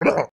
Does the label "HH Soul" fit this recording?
No